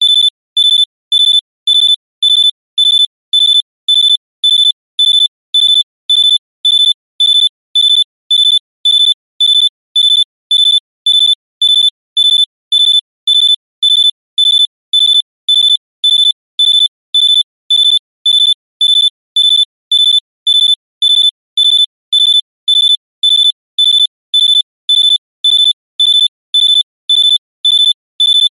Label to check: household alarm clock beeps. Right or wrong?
Right